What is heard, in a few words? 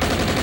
gunfire